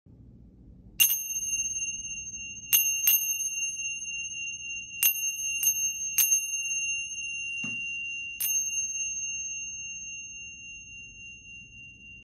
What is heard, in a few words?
finger cymbals